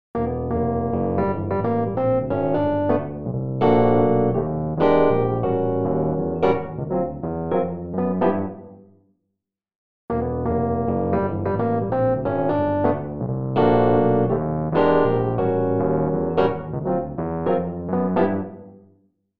pickup distance

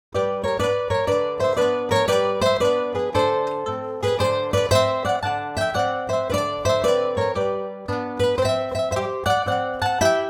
Ethnic, guitar; Pianoteq 8

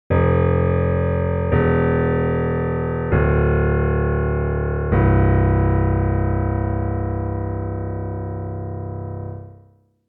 inharm med

